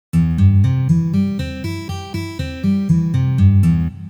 Arpedzhio na gitare Do mazhor

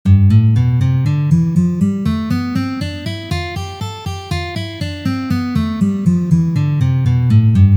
Gamma Lya minor 4 pozitsiya

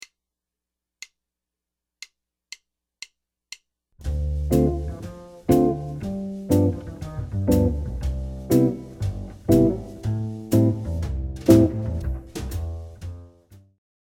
Dzhazovaya posledovatelnost akkordov

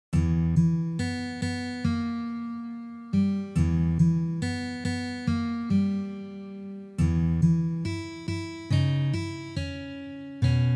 na gitare

